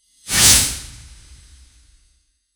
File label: shing metal unsheath soun; fast motion